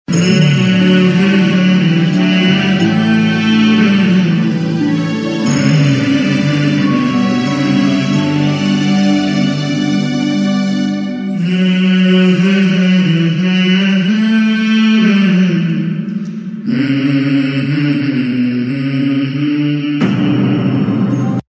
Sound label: Nainon Mein Nidra Ka Swar - Ganesh Tone (Music); TV Serial Tone; Life OK; Devon Ke Dev Mahadev